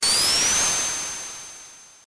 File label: long jump